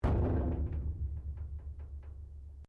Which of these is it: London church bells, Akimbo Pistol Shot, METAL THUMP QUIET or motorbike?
METAL THUMP QUIET